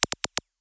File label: TCM5000 flambeat